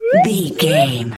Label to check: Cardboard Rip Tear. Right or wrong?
Wrong